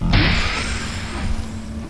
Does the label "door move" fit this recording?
Yes